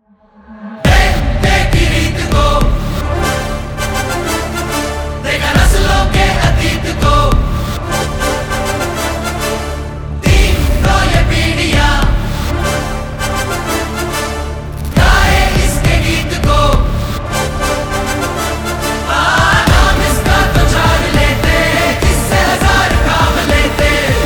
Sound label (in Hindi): Hindi; Jailer 2 (2025)